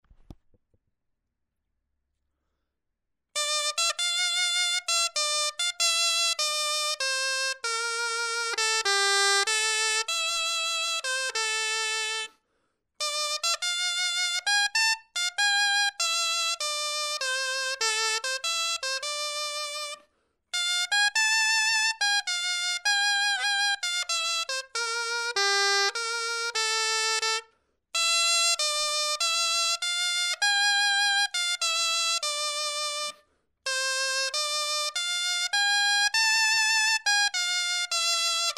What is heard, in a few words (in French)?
clarin etude